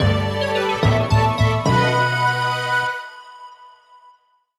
Secret Spot Clear; Hey Pikmin

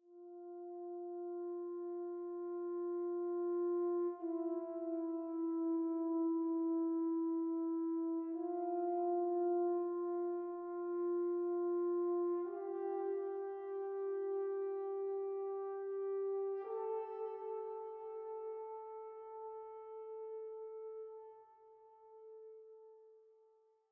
Horn 58bpm 4-4

